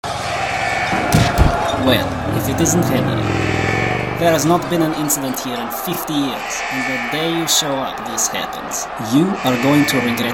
Motorcycle; The Henry Stickmin Collection

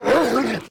Attack; Folk Hero

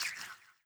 Health Bug Collect

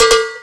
Winter games; Sfx Wintergames

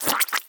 Water Splasher

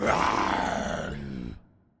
Spirit Death VO

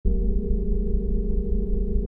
Walk; starbites

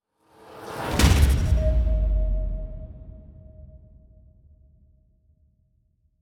Music Raceendsweetener; Forza Motorsport 6 Apex

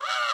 Maze Toon Bird Caw

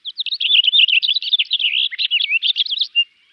Music Frontend

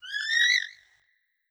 Hawk; Hawk Vo S F X3

